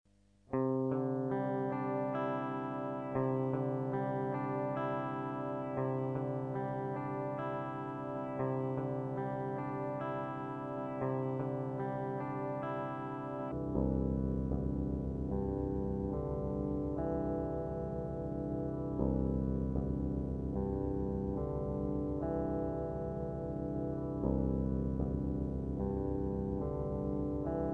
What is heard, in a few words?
chord slow reverse